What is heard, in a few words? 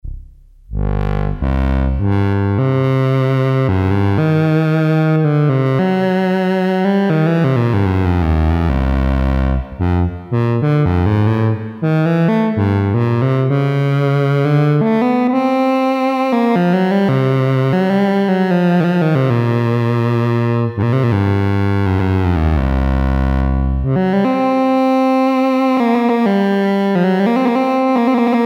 Baritone Sax, Farfisa Syntorchestra